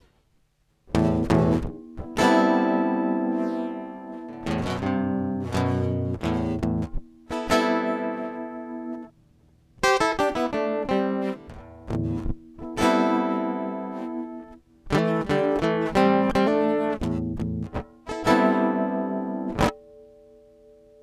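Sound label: acoustic guitar pitch harmo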